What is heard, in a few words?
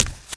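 run left, FOOT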